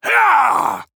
Grunt Attack; DarkLord; Paladins

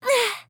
Grunt Attack; Ying

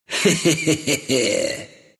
laugh, ru; Dota 2

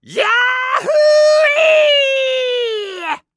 Assassin Skydive; Realm Royale; Six-Shot Cyborg

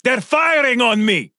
Taking Damage; Fernando; Paladins

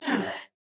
Grunt Jump; BombKing; Paladins

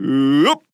Grunt Jump; Makoa; Plushy; Paladins

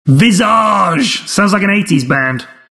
eighties band

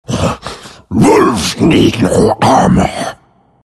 wolf attack; Lycan

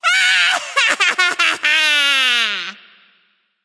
laugh; Godscylla Scylla

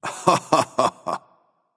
laugh; Lord Bifrost Heimdallr; Smite

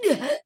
Death Grunt; Kinessa

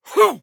Assassin Grunt Jump; Realm Royale; Sly Scoundrel

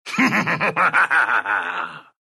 laugh ru; Dota 2

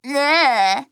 Tongue; Skye

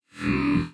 Grunt Jump; Makoa; Paladins

